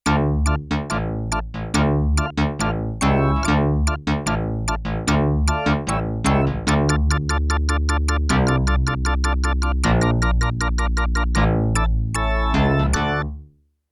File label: Bass Organ Split; Rock Performance 2